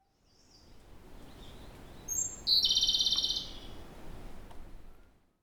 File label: tanekomadori, bird song; タネコマドリ; さえずり; 東京都八丈島八丈町 亜熱帯林